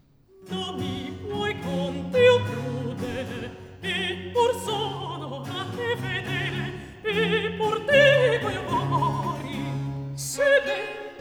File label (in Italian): Duetto; Non mi vuoi con te, o crudele; Antonio Vivaldi; Tito Manlio (2005); Atto 3 - Scena 3; Manlio, Servilia